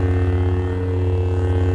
saber hum; weapons

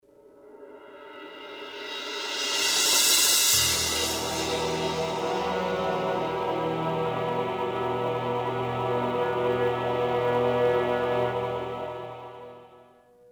mission fail, music; Soldier Of Fortune II Double Helix; XBOX

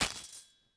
sword drop dirt; objects; Star Wars Obi-Wan; XBOX

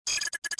fuelpump; Star Wars Jedi Knight II Jedi Outcast; XBOX

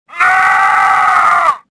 death; stofficer

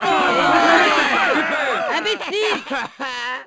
miss

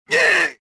choke; reborn

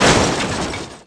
break; Tony Hawk's Project 8; XBOX; ddr

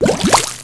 Popup Success; Finding Nemo; XBOX

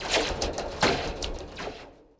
suspension cables move

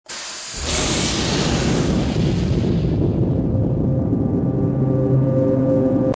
shuttle start; movers objects

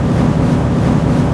fanshaft; movers objects

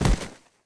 bodyfall grass, foley

